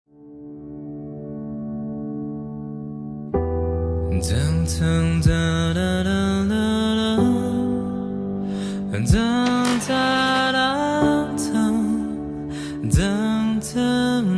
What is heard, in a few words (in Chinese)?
哼唱版 Hum Version; 极速悖论 We Go Fast on Trust; China